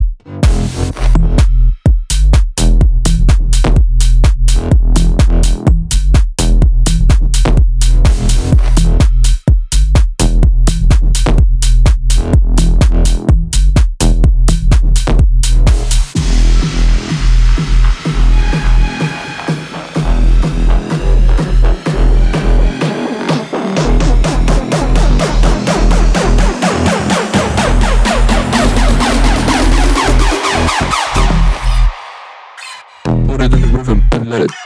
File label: Bass House